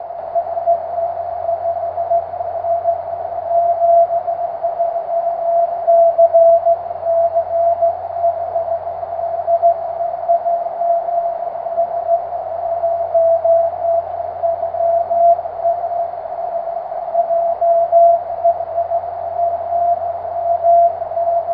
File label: cw, 23cm; jh0ysi